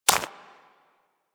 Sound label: Bird Of Prey Step Dirt; Warframe; Footsteps